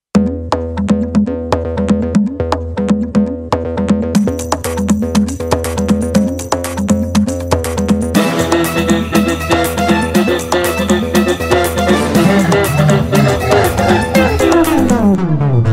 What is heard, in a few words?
instrumental ringtone